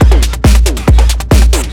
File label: drm 138, Techno, drum-loops; Techno ID; rhythm